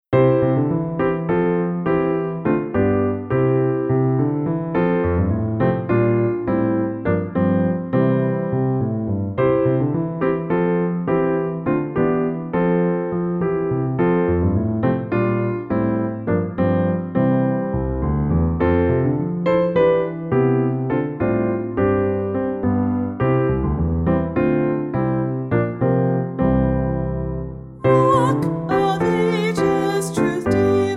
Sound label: hymn